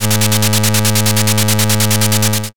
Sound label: slot spin